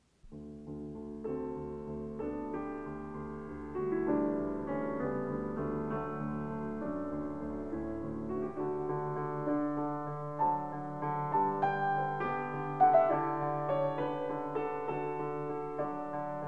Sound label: Piano Sonata No. 15 in D major; Wilhelm Kempff; Beethoven; Piano Sonatas